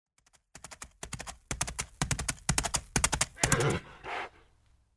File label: Horse; Age of Empires III